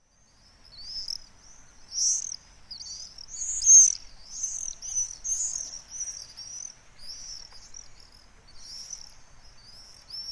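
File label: common swift; Kirkuva, usein nouseva tai laskeva ”srriii”; tervapääsky; Lähes koko maassa kaupungeissa, kylissä ja maalaistalojen pihapiireissä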